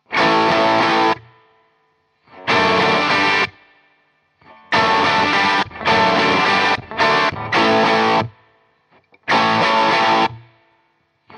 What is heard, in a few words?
Les Paul